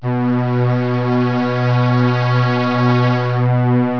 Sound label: string, ambience; svencoop